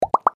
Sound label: rising pops